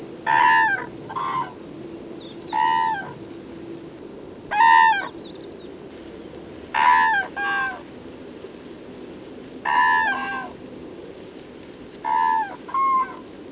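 call, Grus grus, Crane, calls from ground, note that there are some calls given in duet. Background: Crested lark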